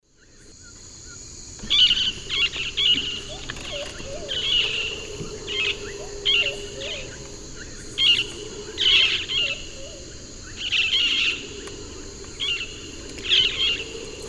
Touit huetii, flock flight calls; S. America; Eastern Lowlands

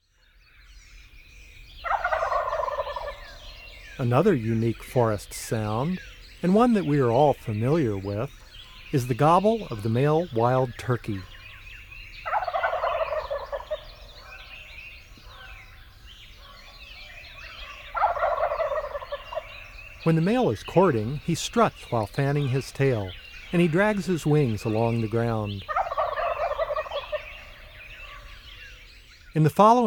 Wild Turkey, Bird Sounds; North America; Common Western Species; Know Your Bird Sounds

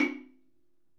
ESSENTIAL PERCUSSION

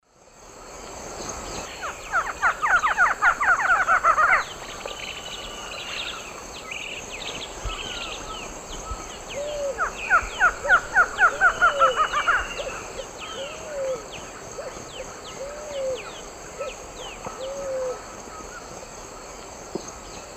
Thamnophilus doliatus, duet; S. America; Eastern Lowlands

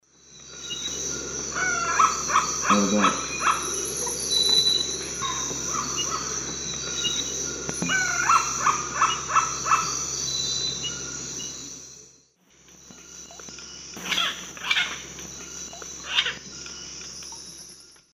Celeus elegans, calls; S. America; Eastern Lowlands

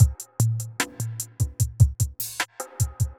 evil beats 75

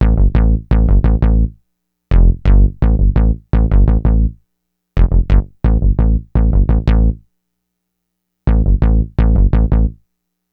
Bass, RnB

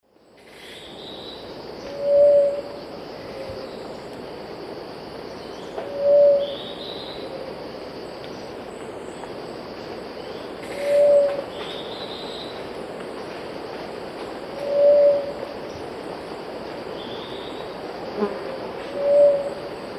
Leptotila rufaxilla, song; S. America; Eastern Lowlands

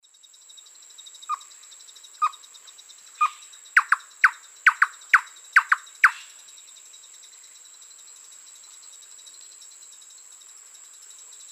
Campylorhynchus turdinus, calls; S. America; Eastern Lowlands

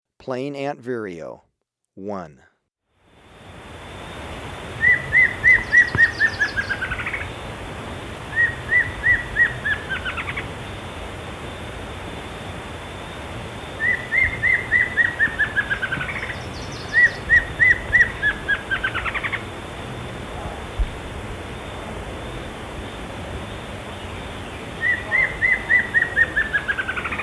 Plain Antvireo, Birds of Ecuador; S. America

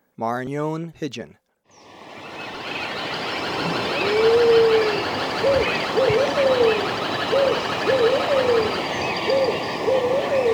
Maranon Pigeon (Peruvian Pigeon); S. America

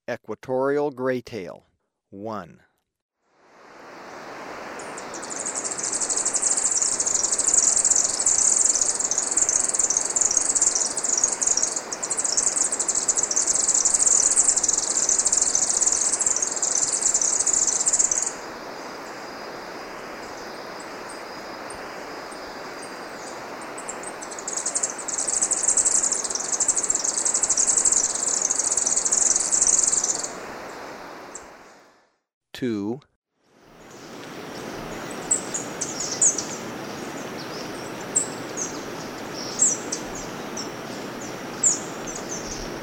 Equatorial Graytail, Birds of Ecuador; S. America

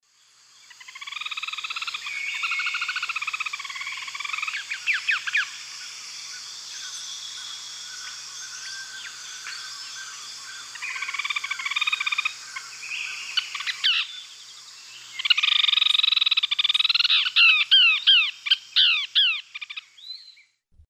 Myiozetetes granadensis, calls; S. America; Eastern Lowlands

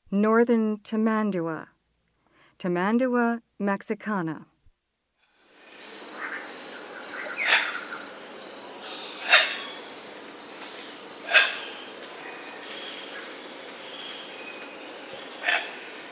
Northern Tamandua; S. America

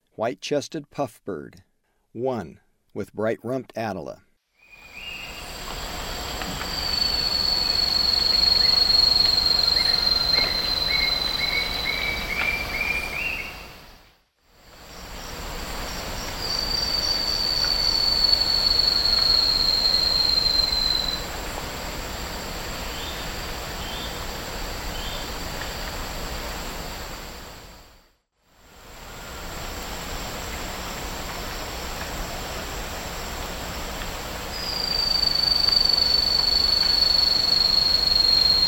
White-chested Puffbird; S. America; Eastern Lowlands

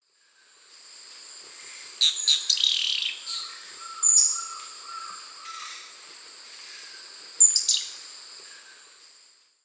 Pipra erythrocephala, song; S. America; Eastern Lowlands

